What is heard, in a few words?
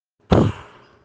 Smoke Poof